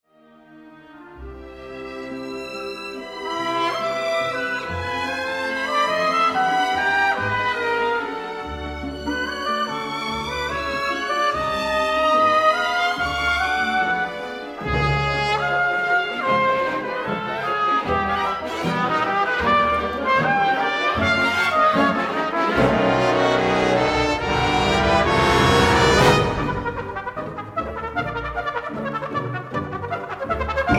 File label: Trumpet Concerto